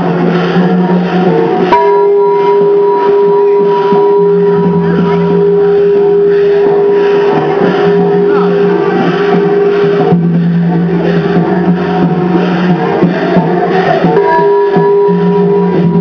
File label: Shwedagon gong; myanmar birma